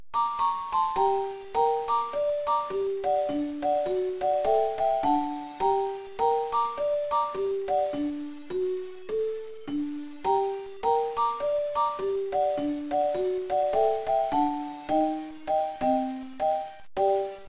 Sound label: game over; Dr. Mario; panel de pon; Gameboy Advance